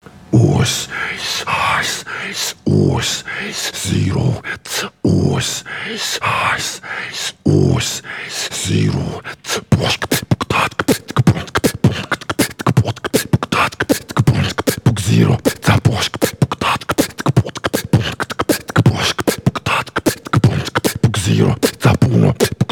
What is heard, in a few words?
Beatbox